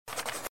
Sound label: pencil sound